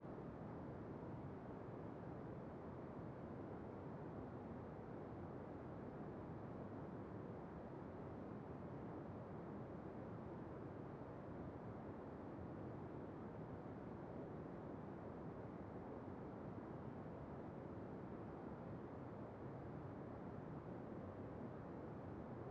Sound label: wind; twinStickCrawler